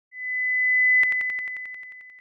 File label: Ringing